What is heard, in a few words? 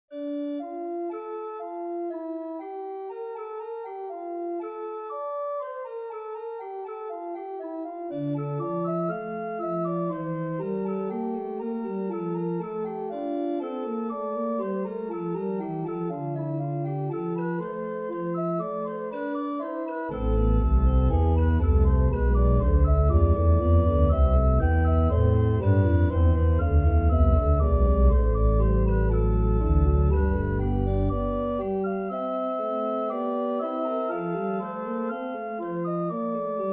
Prelude in D minor, organ; Grafhorst